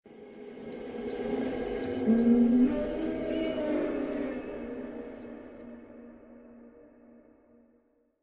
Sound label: Ambient; Forest; scpcb-godot